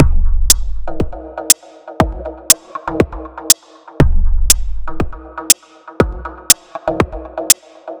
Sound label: Space Dub Beat, Drum Loop; Sonic Foundry; The Electronic Music Manuscript Collection; Drum Loop Structures